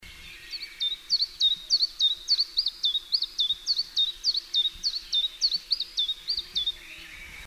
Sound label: song russia, tristis